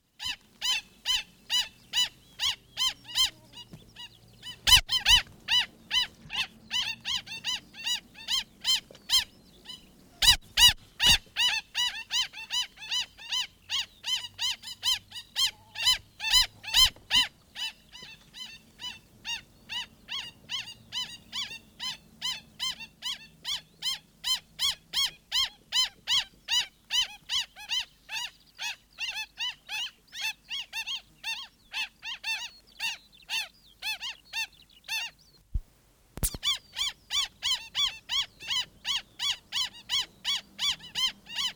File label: black stilt, bird song; native animals